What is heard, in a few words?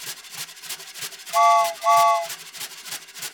choo choo; soundboard